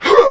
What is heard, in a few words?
Jump